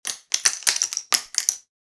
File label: Darts dropping on floor; sport